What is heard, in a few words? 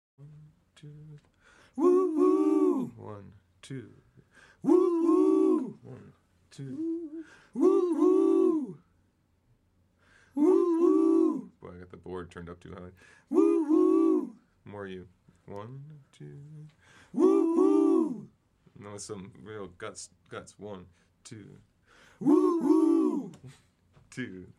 whoohoo